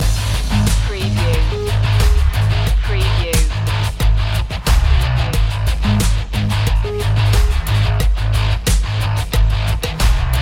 agony loop

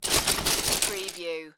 Lego, grab handful of lego from lego box

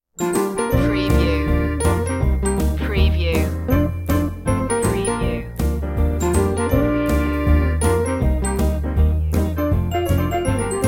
jazzy cartoon main theme

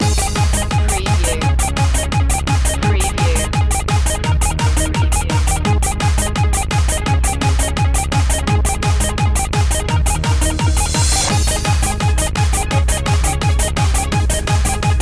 bubble gum beats loop

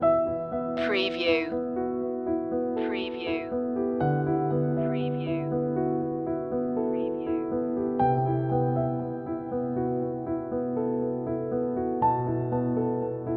frozen memories loop

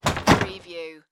Attic Stairs Close; Household Sounds / General Household; sound effects bible

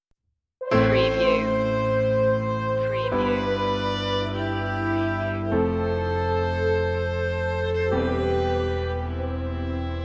floating end part only

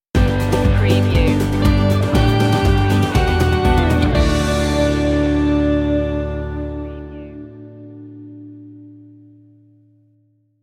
transparent stinger

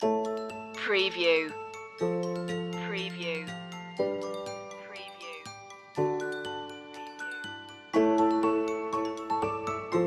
bell shoppe loop

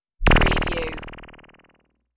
Squiggle

